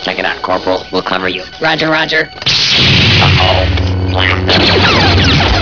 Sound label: blast em